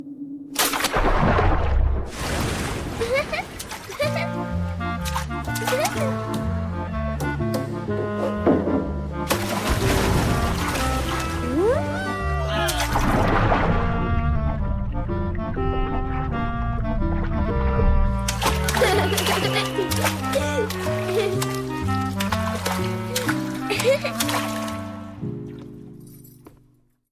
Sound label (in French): Rires et plongeons